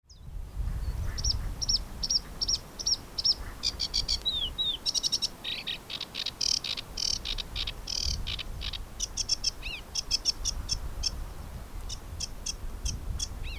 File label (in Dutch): rietzanger zang